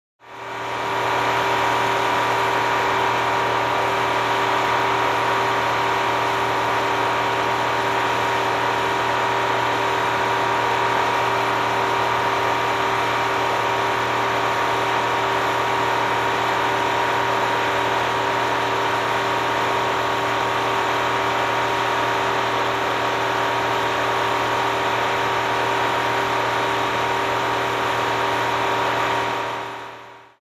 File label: Construction Machine Engine Noise